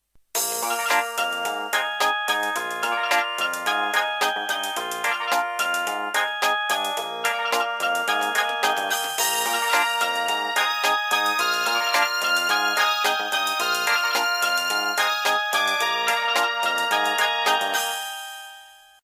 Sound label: nokia 6 ringtone; coconut